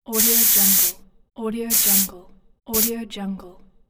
spray; fx-library

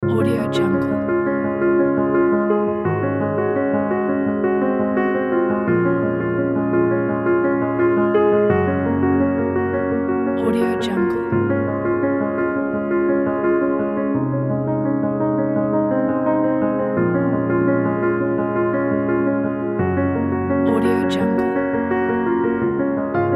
piano emotion

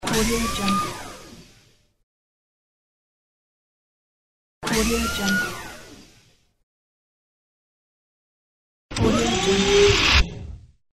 space door

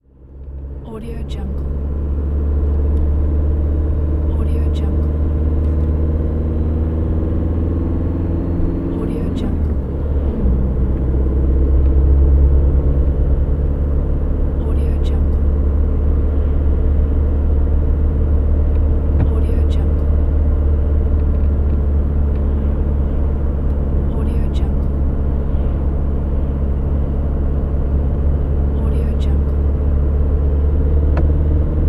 car driving interior

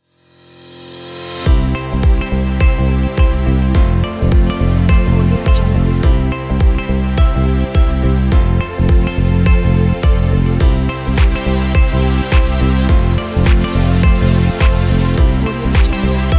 tech corporate

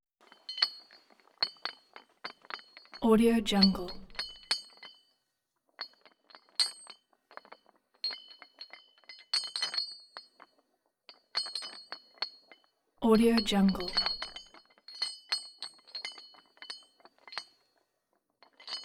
pitched just chains rattling; soundbits-soundfx

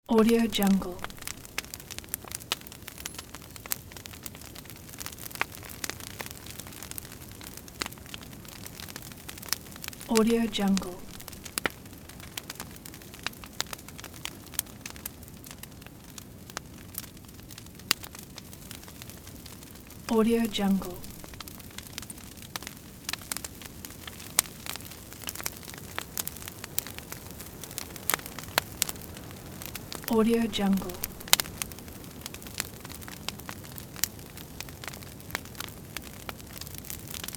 fire; shining-wolf

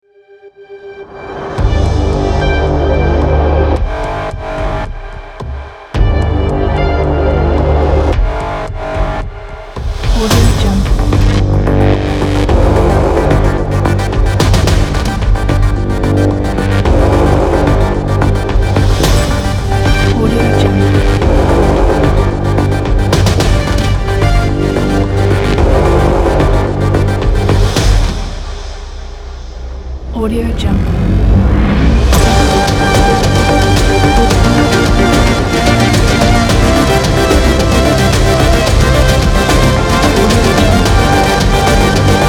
cyberpunk trailer

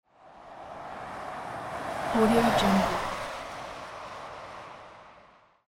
car passing by

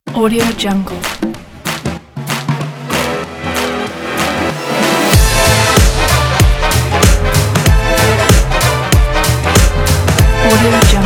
comedy electro swing jazz

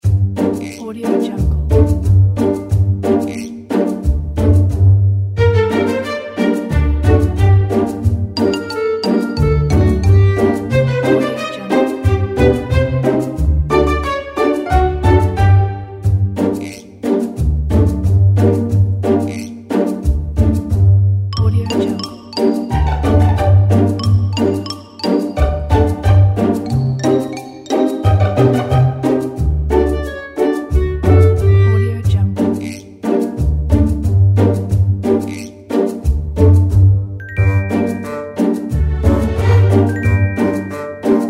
funny background

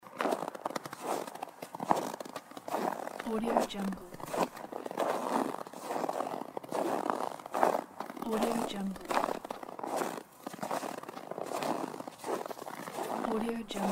walking on snow

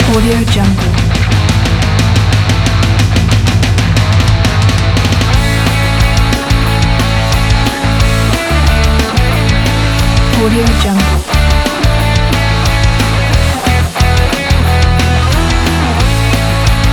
jingle bells punk rock; peanutsound

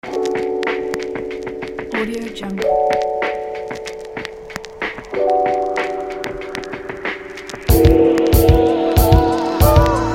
hip hop beat

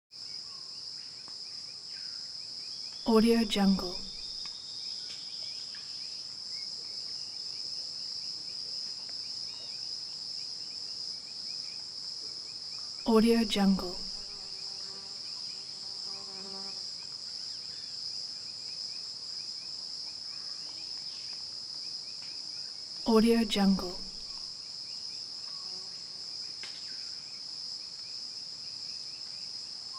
jungle river constant; prosoundfx

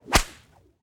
bullwhip impact; prosoundfx

